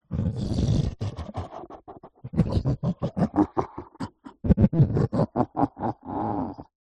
horror evil creature laugh; zapsplat

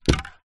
industrial rust spray can drop carpet

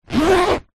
foley luggage case small childs zipper

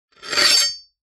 warfare knife blade draw

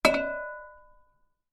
household fruit bowl china hit

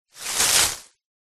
foley bushes tree leaves brush past

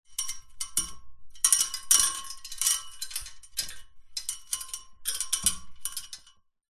household fairy lights wrap around metal pole; zapsplat

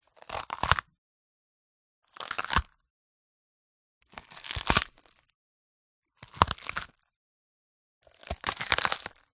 crunch crush bell pepper; audiotank

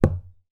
dart hit dartboard; sport; zapsplat

